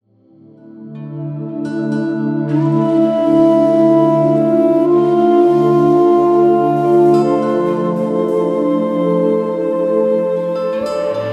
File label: zen far east sounds